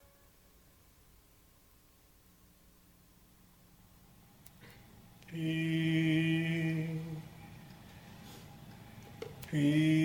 Peace chant

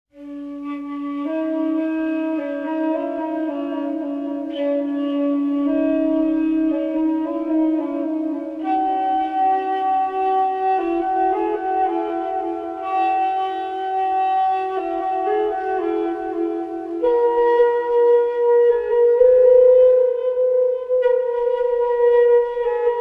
Flute Music

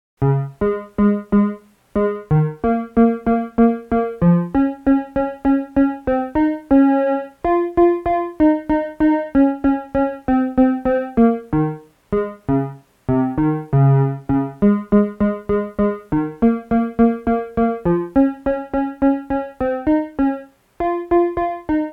korg synthesizer

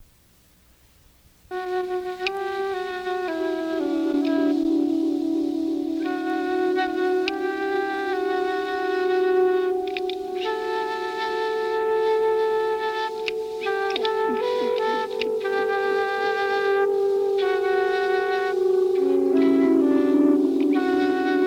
Flute

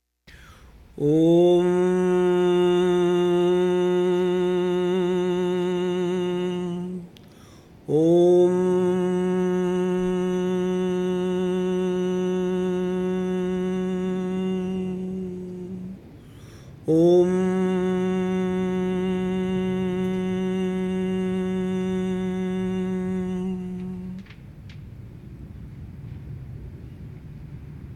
Opening chant AUM

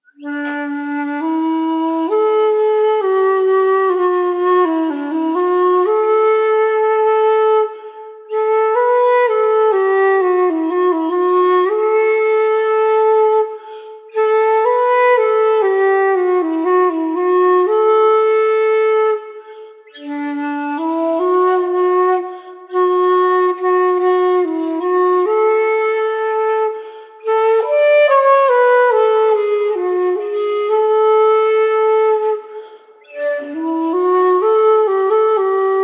flute; Reykjavik Centre

